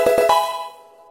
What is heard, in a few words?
pokemon level up